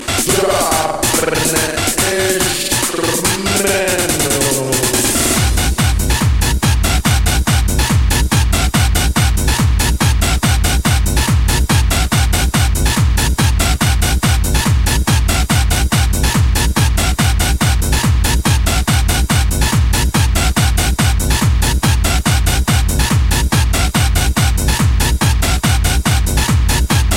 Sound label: techno track